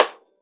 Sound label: RIM, Drums Acoustic; AKAI; 90s sample CD; USB Soundscan vol.10; RAW KIT 8